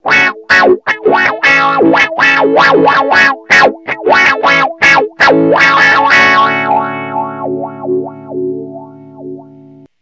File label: wah